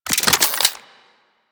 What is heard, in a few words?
Briefcase Akimbo Pistol Reload; Warframe; Weapons; Corpus